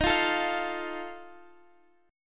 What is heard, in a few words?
Eb Suspended 2nd Flat 5th; strummed; chord; x,x,13,10,10,13; JGuitar